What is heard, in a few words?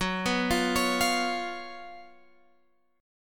Gb Major 7th Suspended 4th, down, Guitar, Open G